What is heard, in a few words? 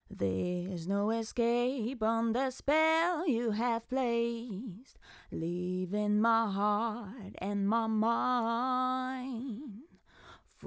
VOC; Sontronics; Sigma 2